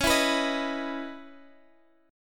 Db Minor 9th Db Guitar Half Step Down; strummed

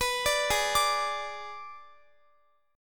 Ab Diminished Ab Guitar; arpeggiated; x,x,21,19,20,21

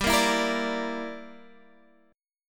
G Minor 6th, Guitar Standard

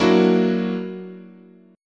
Gb-Augmented 7th, chord, guitar

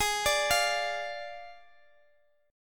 D Diminished G sharp

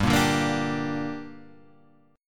G Minor G, chord; Gm Chord {3 1 0 3 3 3} strummed

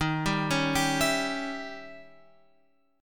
D sharp 7th Flat 9th

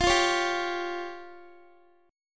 F Suspended 2nd Sharp 5th F down Guitar; Fsus2#5; strummed

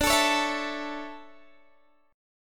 D Major 7th Suspended 2nd, D, down, Guitar Open D

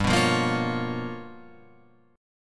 G 7th Sharp 9th Flat 5th, Guitar, Open F; G7#9b5 strummed; JGuitar

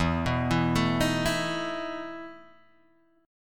E Major 7th Suspended 4th, Guitar, Open G

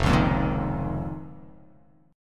F 7th Suspended 4th Sharp 5th F down; F7sus4#5; strummed; JGuitar

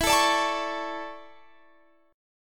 D Major 7th Suspended 2nd E

